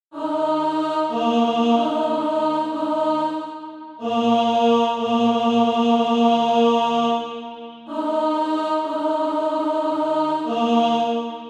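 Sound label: tenor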